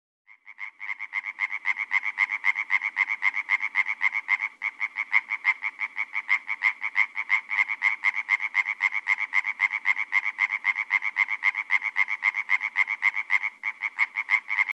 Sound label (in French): rainette verte